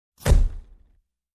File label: Wet Plate Camera Shutter